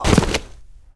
Harpy Die

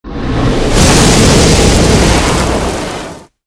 Chimera Skill; Seer; GamigoNA

